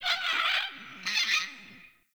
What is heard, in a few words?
Forest Bird Mid; Ambience